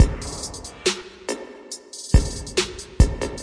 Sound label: 140 bpm double hit verby kick snare hat trap; drum loops and breaks; 131 to 140 bpm